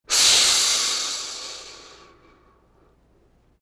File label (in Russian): shipenie alligatora